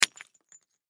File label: Impact Brick Hit With Hammer; Impact Brick Hit With Hammer Break Chip; Hammers; Tools; Sound Effects:Tools; Blastwave